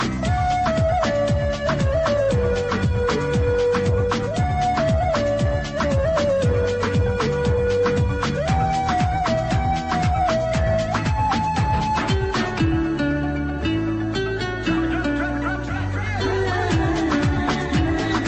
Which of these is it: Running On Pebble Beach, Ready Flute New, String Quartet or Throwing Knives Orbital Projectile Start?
Ready Flute New